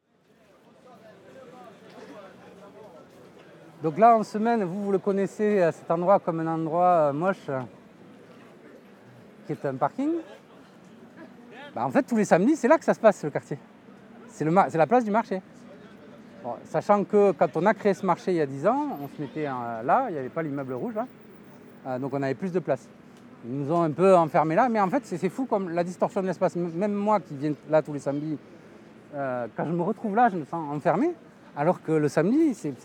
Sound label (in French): Le Marche, quartier de soupetard